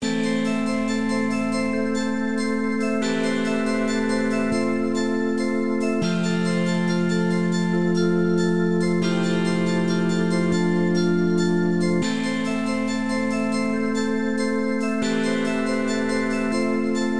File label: Glitter